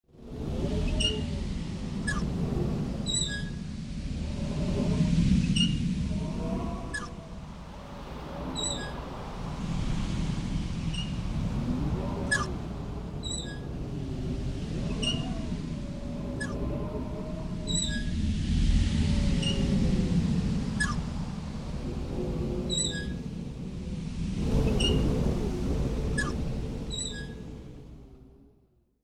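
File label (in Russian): lantern in the wind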